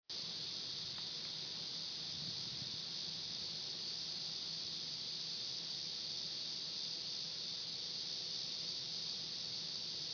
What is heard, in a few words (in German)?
quincy grundrauschen